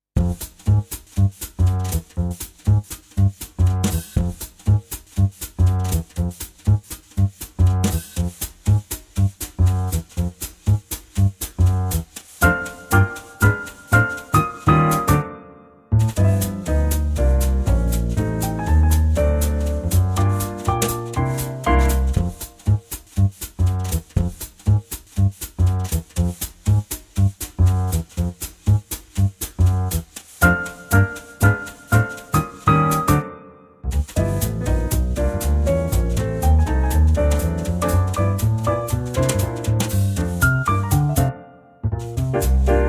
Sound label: F No Brass